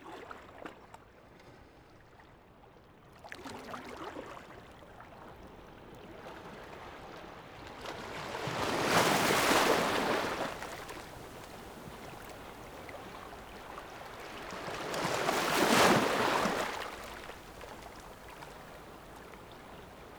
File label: Seashore, Waves